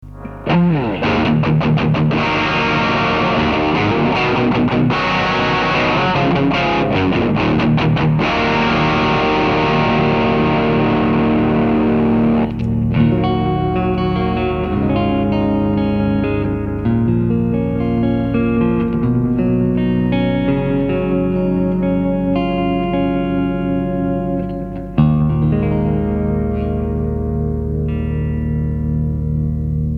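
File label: BOSS LS-2 Line Selector, CLEAN DRIVE, CLEAN DELAY; A：CLEAN DRIVE（SD-2); B：CLEAN DELAY（AD-999)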